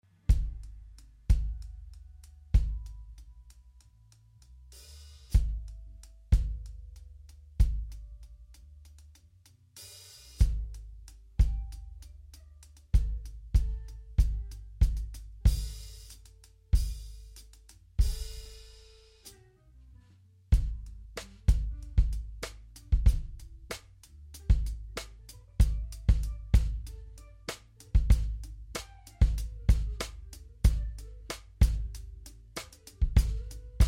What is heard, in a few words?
Low Comp; Gainlab Dictator